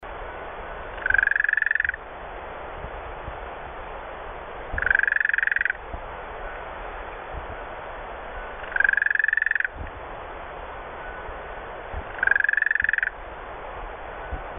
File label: Gastrotheca gracilis